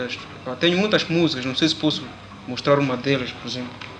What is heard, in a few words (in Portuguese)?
Cantar e Pintar; Mocambique